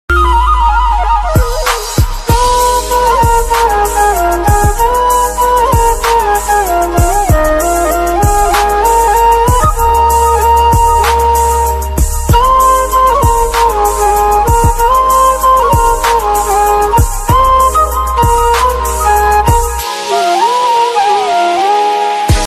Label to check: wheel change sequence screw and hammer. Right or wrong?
Wrong